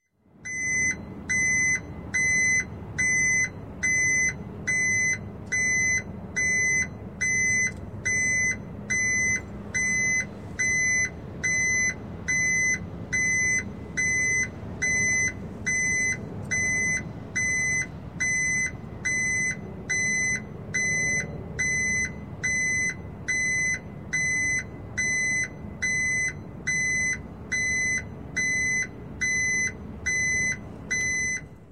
Truck Back Up Warning Signal; Sound Ideas 2000 Series

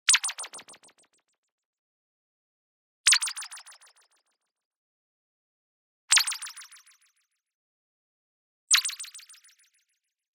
ZAP Delay Modulation; boom library; Anime Essentials; DSGNMisc

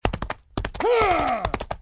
attack; Blind Gladiator; Torquatos

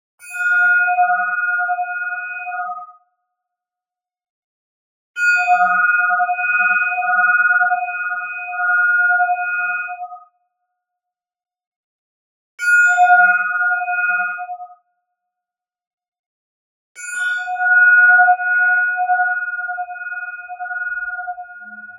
PING Jumping Pitch Tones; boom library; Neon

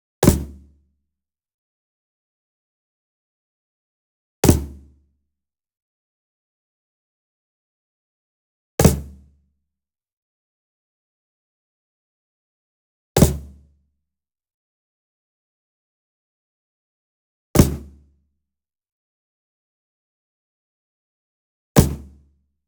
Wood Percussion Cajon Flam Coconut Beater Near; boom library; Cinematic Strikes Construction Kit

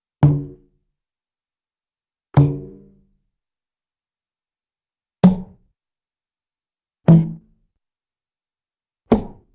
Hollow Drum Impact, Cartoon; Hollywood Edge; Cartoon Trax

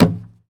Baseball Hit Wall

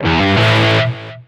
Pickup Guitar Riff; SFX Kit; Pickups

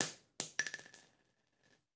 Bullet Shell; Sound Ideas; Guns

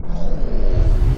Punch Right Speed Up; Thug1; Pitch Black - A Detective's Demise

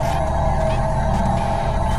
Scifi Duplicator Repair Loop; SFX Kit; Sci-Fi Loops

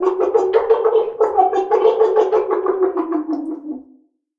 drown; human; NS Studios - ultra sound collection

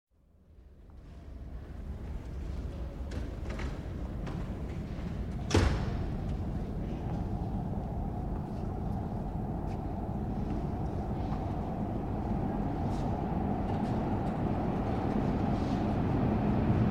Train, Station Platform Ambience, Trains Coming; Trains Coming And Going; Sound Ideas; Series 2000

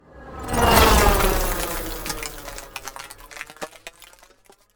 Ice Spell Whoosh Ice Rain, Cold Whooshes; Arctic Aura

